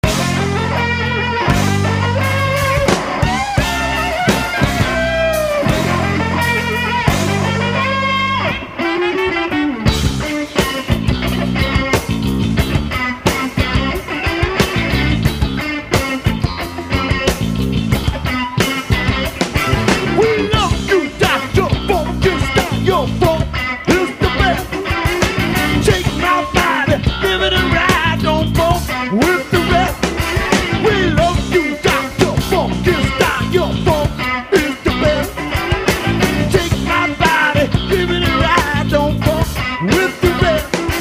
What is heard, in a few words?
funk